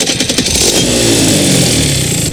Rotax start

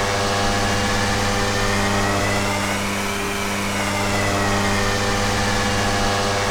KART EX OFF LOW

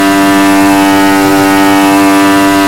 V6T on max; AOS F1 2022

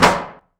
pop; UI Sounds

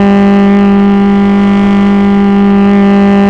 on high ex, Engine; rTec Engine

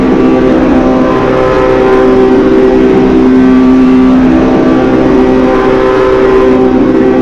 EX OFF MID, F1; AOS F1 2022; FSR2018

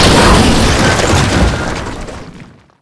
hit wall; AOS RF1 Kart Demo

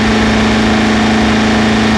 power

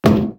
cone hit

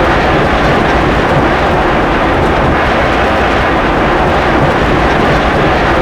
ROADWIND OPENWHEEL, F1; AOS F1 2022

